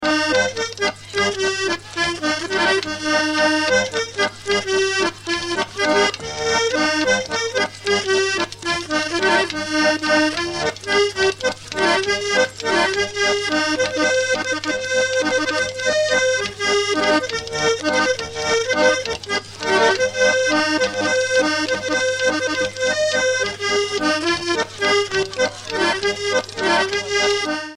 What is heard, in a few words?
Valse ecossaise